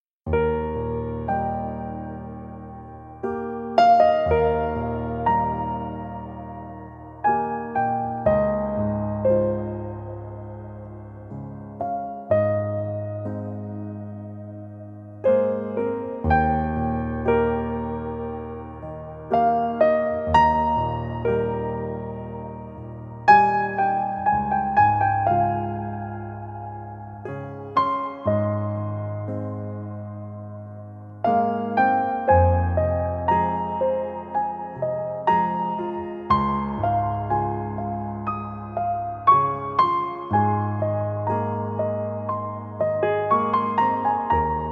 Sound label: lullaby